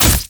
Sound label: basic attack; leona